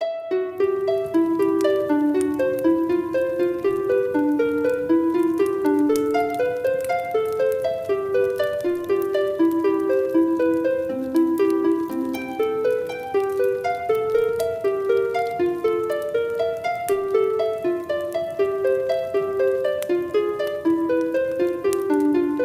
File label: Melody of Lute; Final Fantasy IV